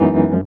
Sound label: E.PIANO, KEYS